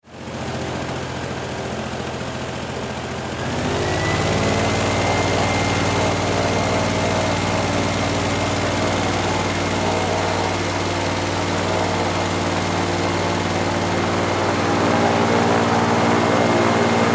Air Boat Rev; Air Boat Idle Rev Idle; Air Boat Engine; Transportation Watercraft; Boat Sound Effects; Blastwave

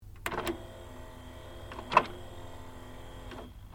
Stereo Akai Mini CD Drawer Rotate; Multi function music player; Electronics

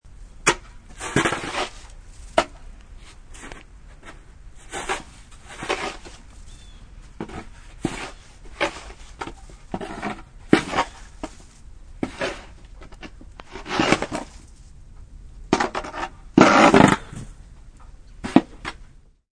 Shoveling snow off sidewalk; Yard Tools

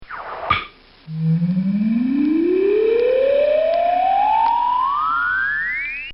Cartoon Sound Effects Whirls; Cartoon Sound Design; Bobby Cole

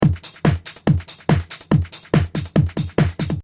Techno Trance Drum Loop 142 Bpm; Drum Loops; Techno-Trance Drum Loop 142 BPM - Use Dis; This sound is part of the LYN117 pack of techno-trance 142 BPM l; Royalty Free Drum Loops

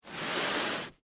Body Drag; Horror; Blood and Guts; BlastWave

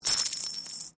Coin Penny Multiple Drop Stone; Common coins; Money; Objects

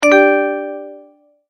multimedia tone start

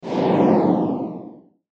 Whoosh Flange Pan; Blastwave

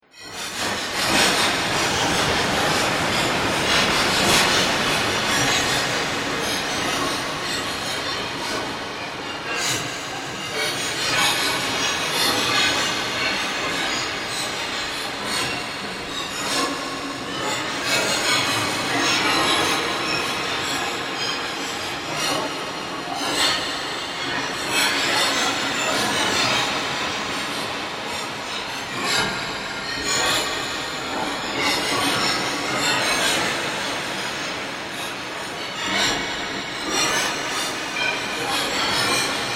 Horror Drone Dark And Metallic; Scary Soundscape Sound Effects

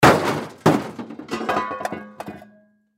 Crash Car Debris Metal Drop; Crashes (Sound Effects:Objects); Blastwave

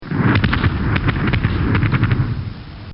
Impact Of Ground Targets; Impact Sound Effects; Combat; Bobby Cole

